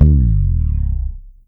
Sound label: FRETSLIDE, PEDULLA BASS; Masterbits CLIMAX 7 - Bass